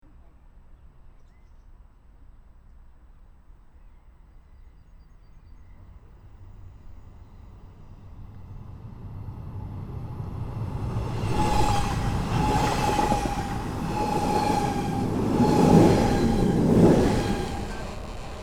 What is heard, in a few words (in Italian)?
in transito TILO Passo in Tenero; rumore lontano